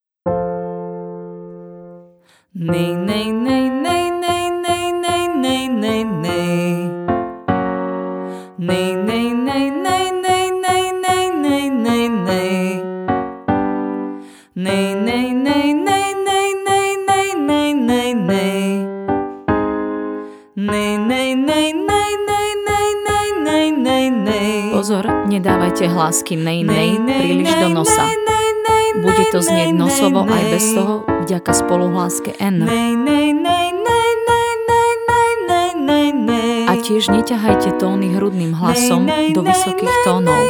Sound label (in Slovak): Cvicenie Zeny spev